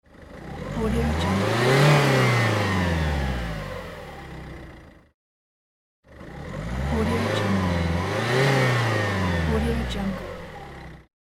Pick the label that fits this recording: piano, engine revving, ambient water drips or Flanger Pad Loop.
engine revving